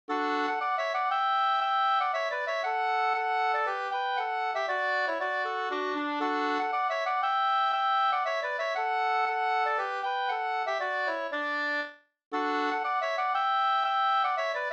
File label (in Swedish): polska, 2st; Ahlberg Lilleskog Vg